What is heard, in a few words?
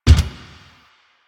Gear Shift Up, Motorcycle; Motorcycle Ninety Nine